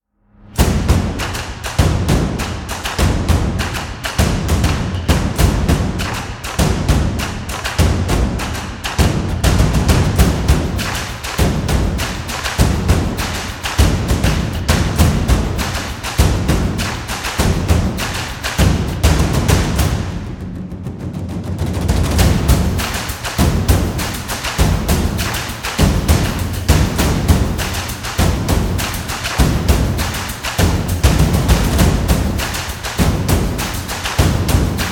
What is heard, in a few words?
Cinematic Stomp